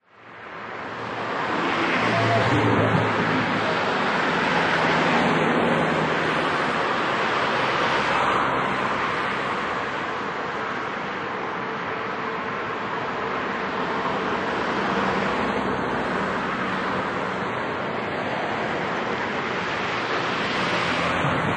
Night Highway Traffic, Evenly flowing traffic at medium speed; in both directions, approx. 60 km/h (40 mph)